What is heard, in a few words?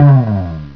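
GOING DOWN, malfunction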